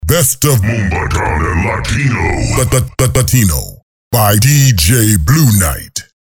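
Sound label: Moombahton Latino without Effect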